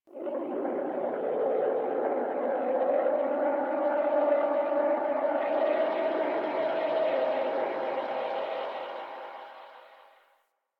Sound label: Landscape Wind Atmosphere Anomaly, Ambience; One Offs; Warframe; Venus Open World